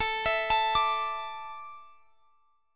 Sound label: A Suspended 4th, Guitar Standard; x,x,19,21,22,22; arpeggiated; capo=9